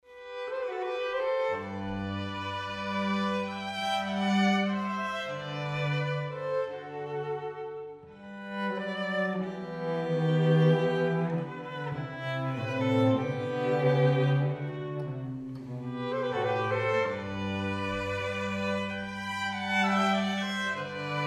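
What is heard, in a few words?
quartet; viotti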